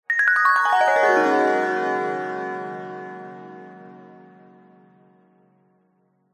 magic glissando descending bright warm twinkle; fantasy; zapsplat

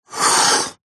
beer can empty slide table; food drink; zapsplat

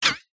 cartoon metal impact hit; zapsplat

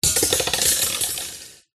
impact metal cage small drop on wooden surface

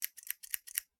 office scissors cut air fast x4; sound effects one

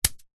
leisure hacky sack land on concrete ground

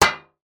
impact metal tin lid on tin; sound effects one

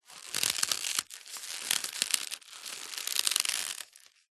rope old stress creak sweatener; foley; zapsplat

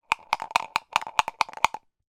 leisure board game dice shaker x1 dice; yahtzee

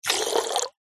water jet spray fast forced syringe into water; foley

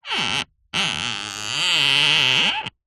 string rub wood squeak; foley

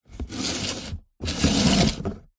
cardboard shoebox empty slide gritty hard surface; zapsplat

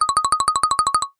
cartoon flutter eyelids shake

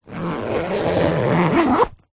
foley bag backpack zipper; zapsplat

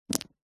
batteries aa x2 set down carpet

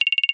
multimedia game sound menu toggle slide select

